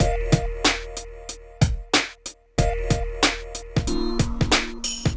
PHATT BEA; E-MU Formula 4000 Series Vol. 1; Hip Hop Nation; PhattBeats91-94

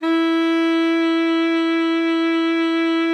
E4, alto sax; Xsample VOL-2

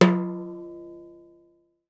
timbale hi, Yamaha Motif ES drum machine; 300 Drum Machines; Yamaha Motif ES-Series

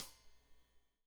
Hat Tight, Hi-Hat, Cymbals; Deeply Sampled Drums

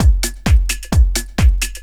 drum 130 groove; Techno Trance Essentials

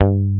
Basses, Roland

